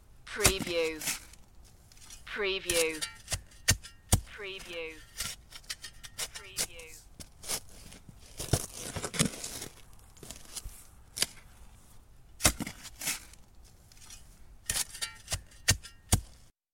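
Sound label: Shoveling Sandy Dirt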